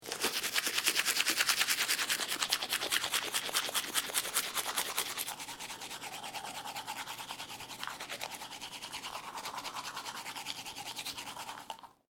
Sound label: Brushing Teeth Bright Open Sound Brushing Front Teeth; Brushing Sound Effects